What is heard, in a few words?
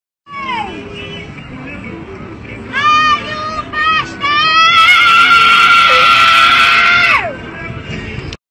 funk